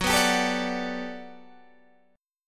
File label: G Major 9th, F sharp